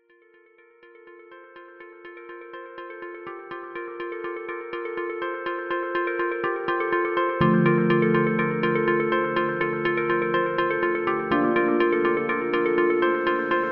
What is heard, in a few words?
Corporate Customer; corporate music vol 3